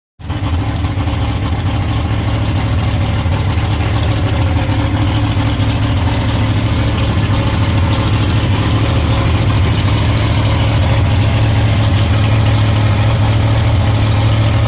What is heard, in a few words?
Drag Boat Idle